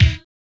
BD; E-Lab; X Static Goldmine 1

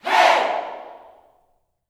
"Hey", Vocal Gospel yell shout; Spectrasonics; Vocal Planet Vol.1 Gospel; akai

